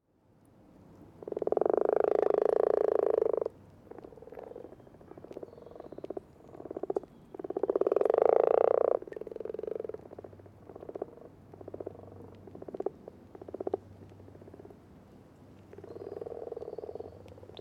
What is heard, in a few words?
frogs